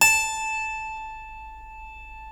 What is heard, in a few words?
A 2 CLAV; Keyboards Acoustic; AKAI; USB Soundscan vol.17